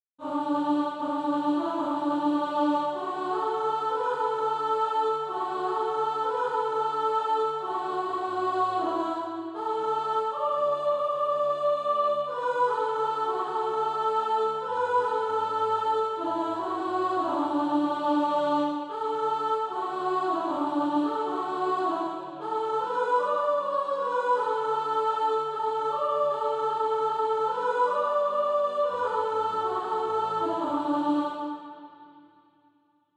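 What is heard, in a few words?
soprano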